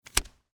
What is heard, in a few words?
Rope Cut Cotton; Hand Tools (Sound Effects:Tools); BlastWave